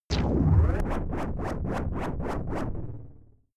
shot wobble lurking

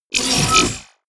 sci-fi page forward; energie

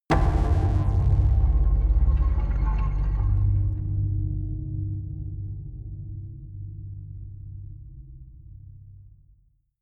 big drum flutter echo; hits explosionen

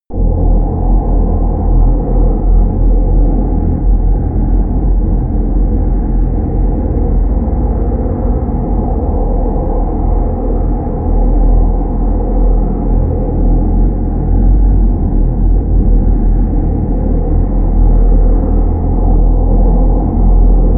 drone stealth low

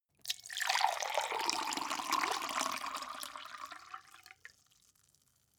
glass pour hard; berufe; gastronomie; soundpool

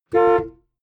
car horn short interior hummer h3

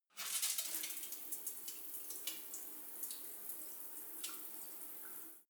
shower soft drip; haus garten; badezimmer

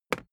briefcase plastic handle bounce; industrie wirtschaft; bueroarbeit

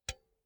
pull out cinch quick; objekte; elektronik

